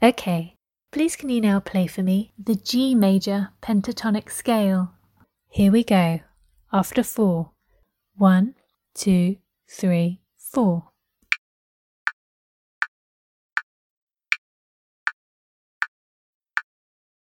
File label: g major pent scale